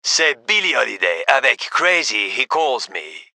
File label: music